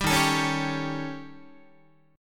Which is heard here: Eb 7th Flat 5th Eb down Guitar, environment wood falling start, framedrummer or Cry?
Eb 7th Flat 5th Eb down Guitar